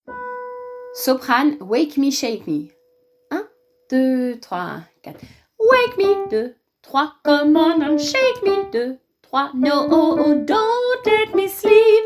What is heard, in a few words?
Wake me Shake me Soprani